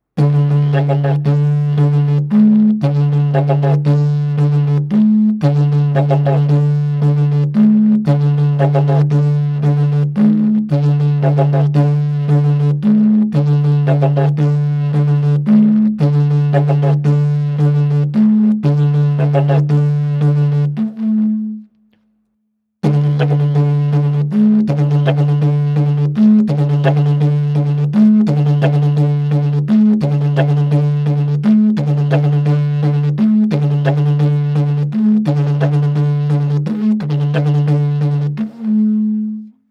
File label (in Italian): ritmo livello avanzato